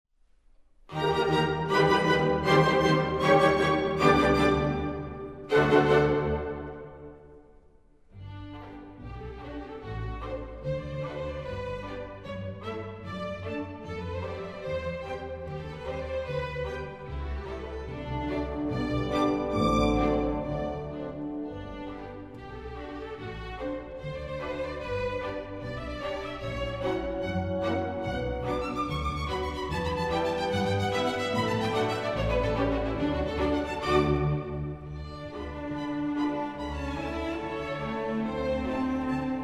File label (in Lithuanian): Baleto muzika; Gounod; is Fausto